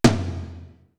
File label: ATOM, DRUMS; RY30DRUMS; East West; Global Sounds